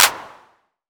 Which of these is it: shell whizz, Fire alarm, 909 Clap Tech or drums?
909 Clap Tech